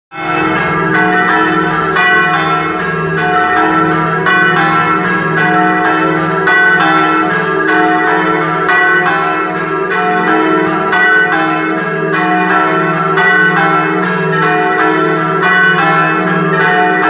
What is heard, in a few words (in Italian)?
campane; campane canale; giornale radiopiu 14 ottobre 2021